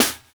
Snr2 SP, Roland MV-1200

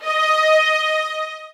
SOFT VIOLINS

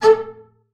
A4, BASS SPICC; Xsample VOL-5

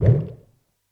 Bubble Short

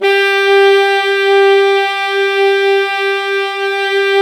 SAX ALTO; Roland - LA Composer vol. 1

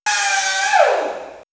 Elec FX Sci Fi Creature; X-Static Goldmine

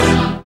synth chord, dance synth; Sound & Vision - Gigapack I CD 2 (Roland)

